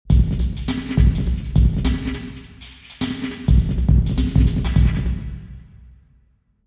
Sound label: alcuin drum; alcuin college university york